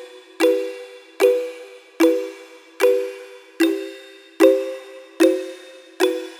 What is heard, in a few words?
Synth Loops E 150 Strum; Trap and Dubstep